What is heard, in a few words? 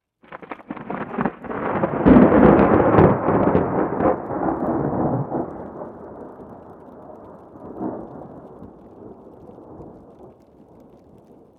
nature thunderstorm top chacaltaya mountain 5300m bolivia; felix blume